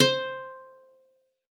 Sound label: Soft C5, Campfire Nylon guitar; Worra's Campfire Nylon